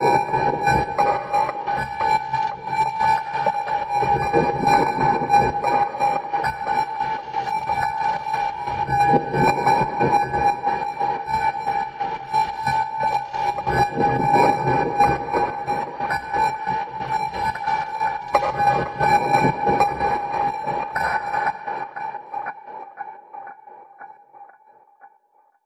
Raiders Rhythm Pulse

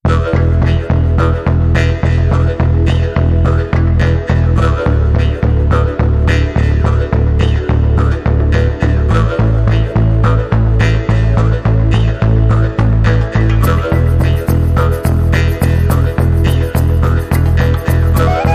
Lowland No Vocal SFX

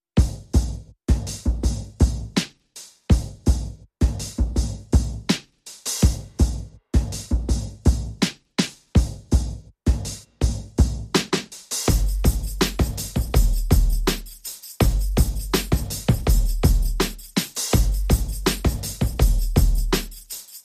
Perc Only

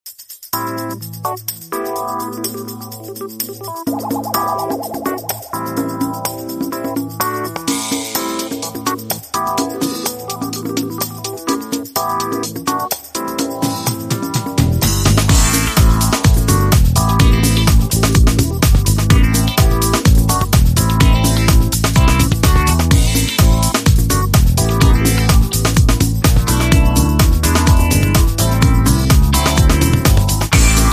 Focus On The Flute, Rhythm

